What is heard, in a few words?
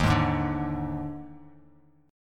Eb Major 7th Suspended 4th Eb, Bass Standard; strummed; 11,11,6,7; EbM7sus4